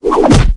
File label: bash, dragons